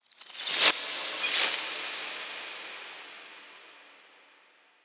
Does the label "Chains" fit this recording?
Yes